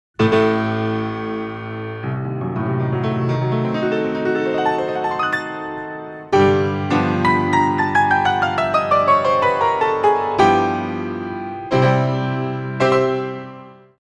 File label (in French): Piano; Les instruments de musique